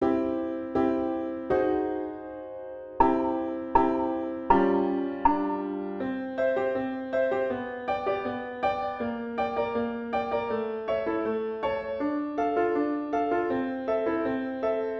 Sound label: Polka